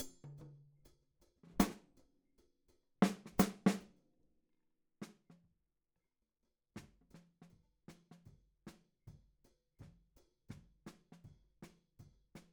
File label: Hat